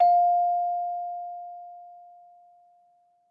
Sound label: Musical Bellflowers